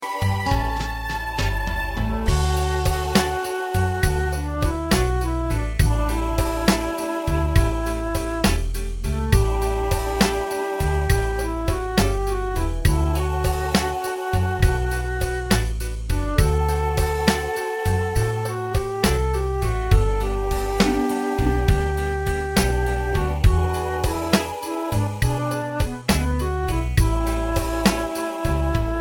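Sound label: champagne instrumental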